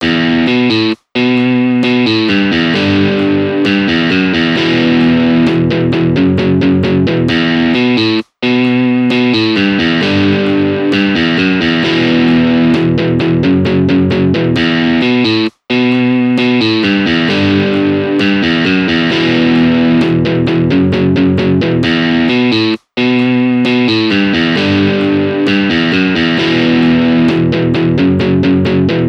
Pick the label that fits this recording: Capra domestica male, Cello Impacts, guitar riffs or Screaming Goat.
guitar riffs